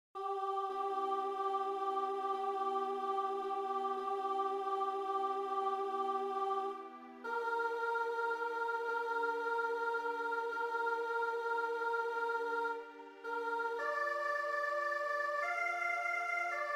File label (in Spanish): misa voces blancas señor